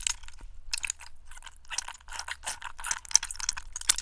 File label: Flesh Move Medium